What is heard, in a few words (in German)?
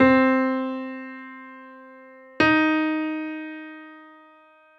kleine terz